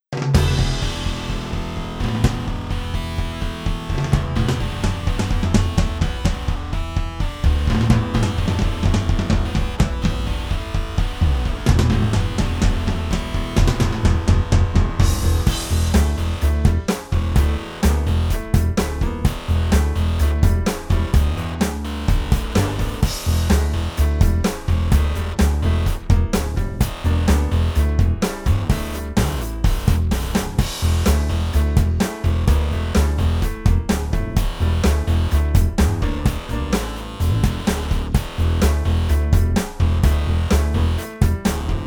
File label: dry clean stick; tascam